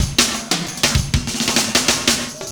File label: FILLS, 70_s Breakbeats